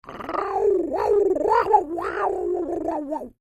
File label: Creature Oodle Bird Speak Phrase Long; Animals